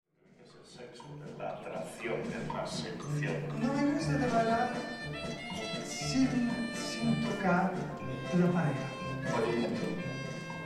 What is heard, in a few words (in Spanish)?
Aplausos